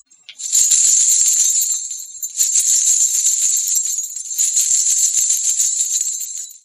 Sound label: Beaded Belly Dance Hip Scarf Wrap